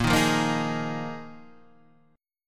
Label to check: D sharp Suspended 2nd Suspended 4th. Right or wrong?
Right